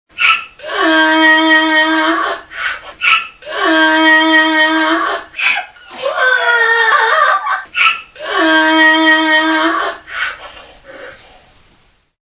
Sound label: Ee-Aw ringtone